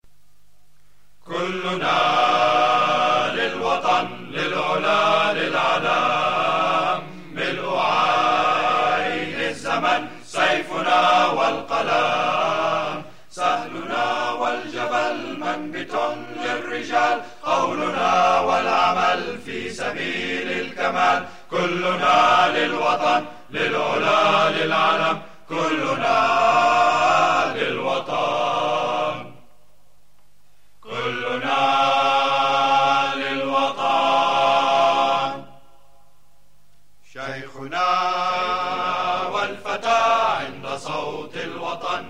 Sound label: Lebanese National Anthem